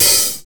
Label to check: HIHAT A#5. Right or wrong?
Right